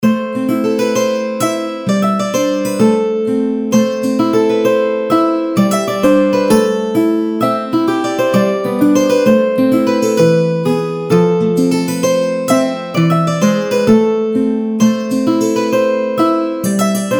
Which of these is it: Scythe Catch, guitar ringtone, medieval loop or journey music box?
medieval loop